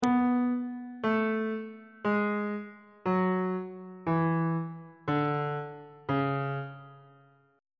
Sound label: d flat phrygian mode descending on bass clef